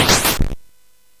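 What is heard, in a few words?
Nebulus, MOS 8580 SID chip music, games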